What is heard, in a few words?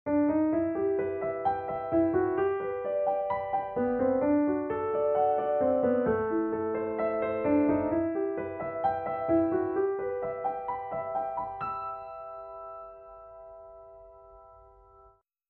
Piano